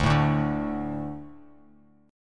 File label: D 5th D, down, Bass Standard